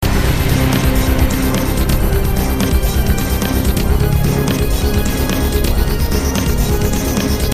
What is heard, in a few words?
loop